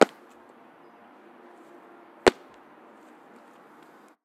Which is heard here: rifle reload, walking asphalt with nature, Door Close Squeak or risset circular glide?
walking asphalt with nature